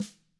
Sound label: TAMA SNRD; ILIO; Double Platinum Drums 1